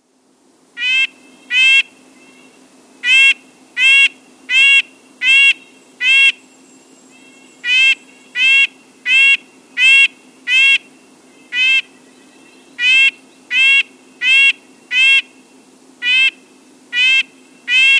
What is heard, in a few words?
Red-breasted Nuthatch